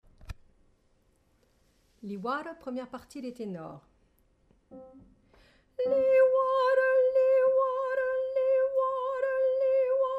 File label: Tenor